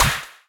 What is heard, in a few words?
right Orange Catch; HeavenStudioPlus; catchyTune